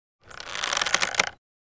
Archery bow draw

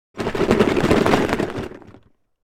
Plastic container or box shaking objects inside; gfxsounds

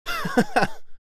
Joyful man laughing

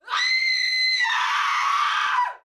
Female screaming woman in pain

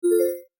Infographic call answer notification

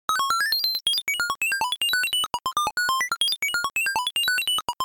Science fiction vintage data transmission

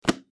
Book closing

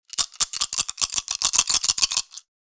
Dice shaking slow board game

